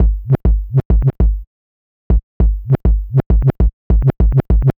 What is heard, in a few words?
Vinyl Scratch Kick